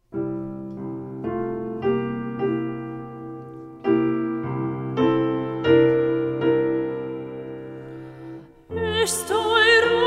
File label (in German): Latein Sopran; Europasymbole